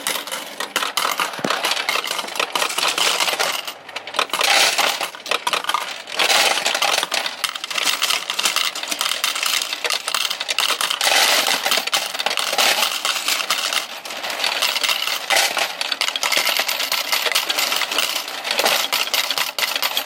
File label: coin counting machine